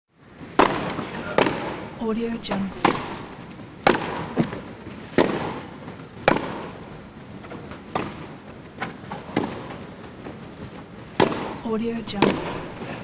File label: tennis game on stadium